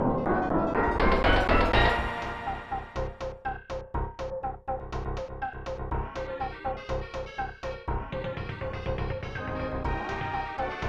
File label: Battle; Kishin Douji Zenki Tenchi Meidou; SNES